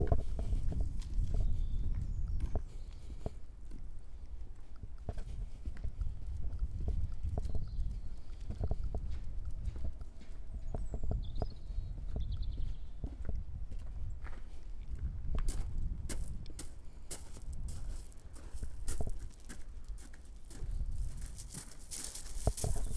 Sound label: Gravel